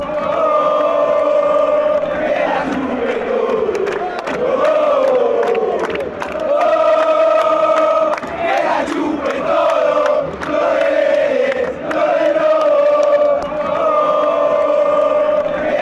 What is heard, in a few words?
you can all suck it, chants